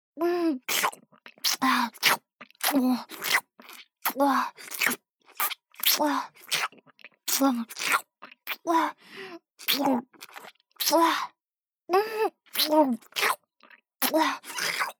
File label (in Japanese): ピストン フェラチオ ループ